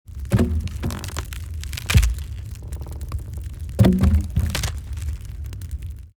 Tree Break Bend Burning

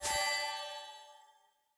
Holy Magic Cast Spell

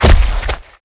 Body Falls Torso Mud Dry; BIGMOUTH

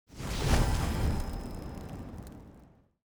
Holy Magic Burn Spell

